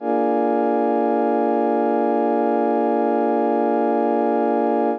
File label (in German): akkord b7 f1 tief